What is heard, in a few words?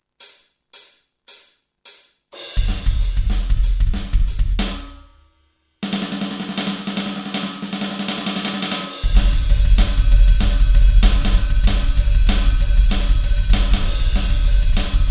Double Bass Difference; Double Bass Experiment